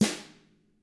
DW1BR FR R, Drums, BRUSHR

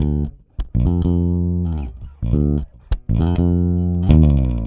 Rock, Bass; Bass X

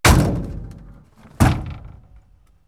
Massive; X-Static Goldmine

